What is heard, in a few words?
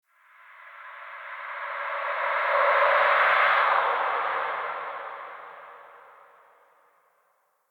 Shriek, Ambience, Crypt; Champion Crypt